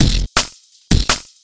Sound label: Beat, Futurist Drum & Bass; Drums & Percussion